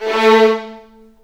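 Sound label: A3 violins; The Ultimate String Collection; East West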